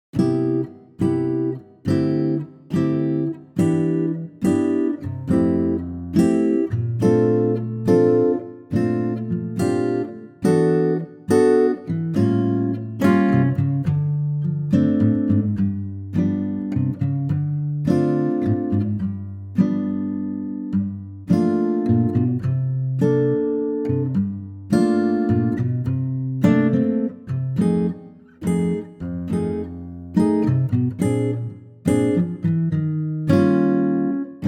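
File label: F Ac Guitar, male; key - F - vocal range - D to D; Acoustic Guitar arrangement of the classic jazz standard; Real Book key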